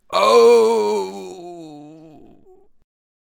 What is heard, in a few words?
Death Vocals; Tank